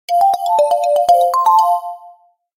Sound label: calling